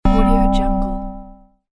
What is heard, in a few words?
wrong answer sound